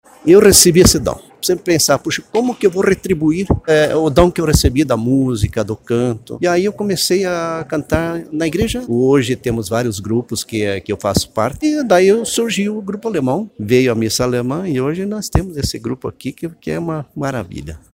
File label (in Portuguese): O dom do Canto